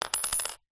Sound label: money australian 20 cent coin set down on tiled table spin; foley